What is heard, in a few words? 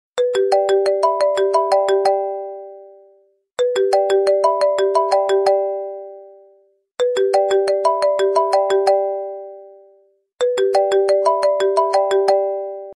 classic iphone ringtone